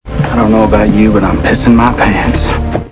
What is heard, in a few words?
scared